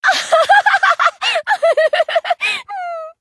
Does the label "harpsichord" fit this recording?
No